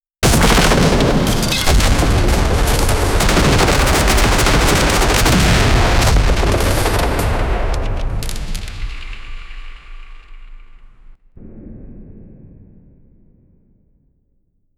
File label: medieval castle battle; fantasy